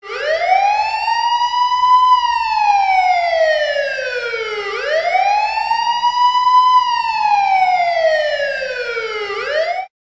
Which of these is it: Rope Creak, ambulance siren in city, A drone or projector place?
ambulance siren in city